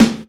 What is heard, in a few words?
Snares Electro